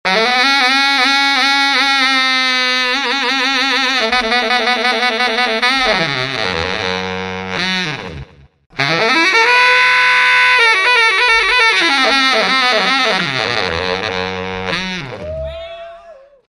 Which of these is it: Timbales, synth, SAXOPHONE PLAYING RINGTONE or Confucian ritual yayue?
SAXOPHONE PLAYING RINGTONE